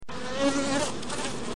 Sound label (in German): Biene; Tierstimmen